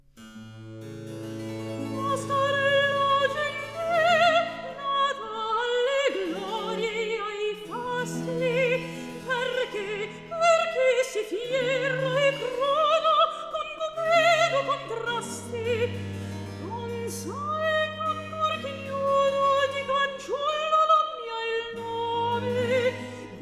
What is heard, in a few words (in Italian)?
Recitativo; Patorella gentil; Alessandro Scarlatti; Duet Cantatas